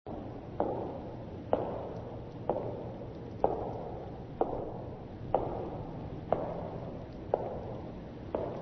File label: footsteps